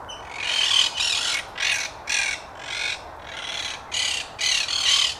Monk Parakeet, Mönchssittich

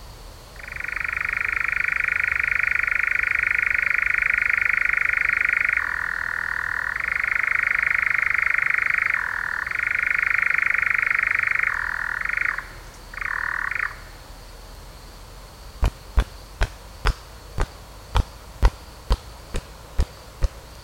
European Nightjar, Ziegenmelker

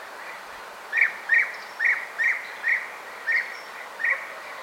European Bee-eater, Bienenfresser

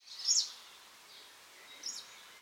Greenish Warbler call, Grünlaubsänger

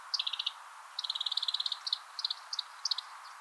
yellowhammer call, Goldammer

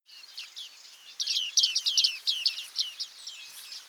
Bearded Reedling, Bartmeise

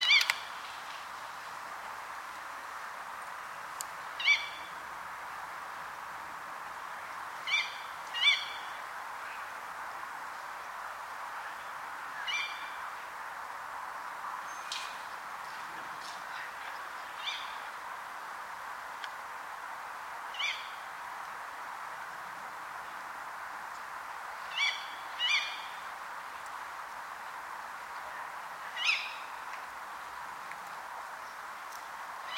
Tawny Owl, Waldkauz

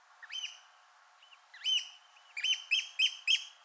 Eurasian Oystercatcher, Austernfischer

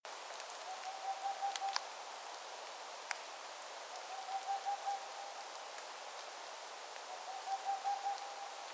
Boreal Owl, Raufußkauz

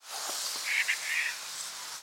Caspian Tern, Raubseeschwalbe

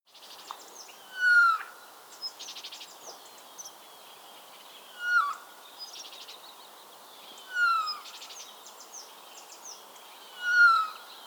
Eurasian Jay, Eichelhäher

